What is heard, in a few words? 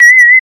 new invader; moondef